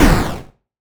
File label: Retro Weapon Gun LoFi